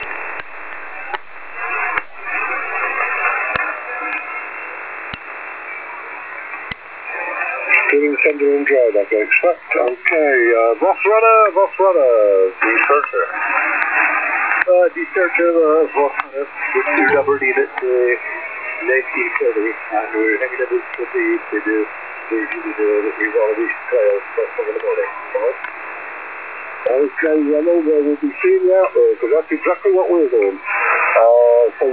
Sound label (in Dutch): onbekend, Onbekende stations HF; 2.311.00 onbekend USB